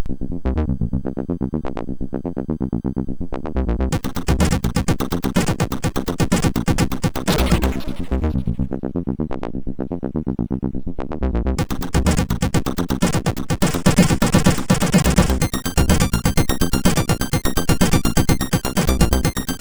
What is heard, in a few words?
Music 2, MOS6581; MOS6581R4